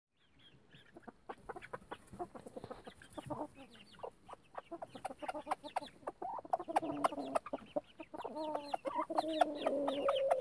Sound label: mommy signalling food